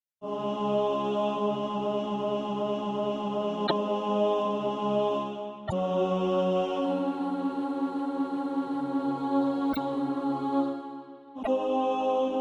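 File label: tenor, Practise Music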